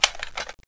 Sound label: m4 m203 load